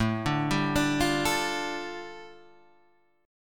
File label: A 7th Suspended 4th Sharp 5th, A